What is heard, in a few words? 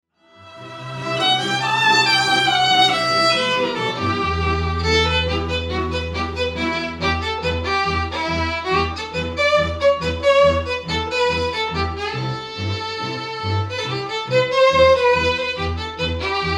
Solo Examples